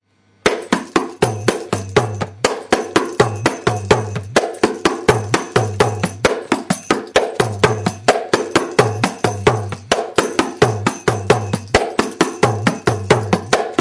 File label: kanjira 4 4 groove